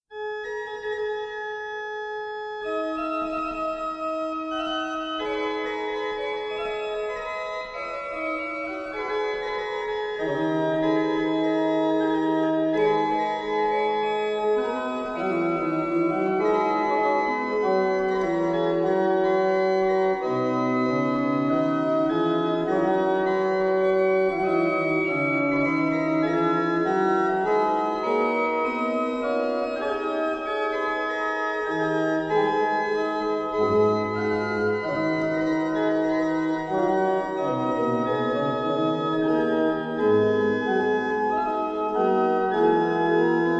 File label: Organ Voluntary Fantasia In A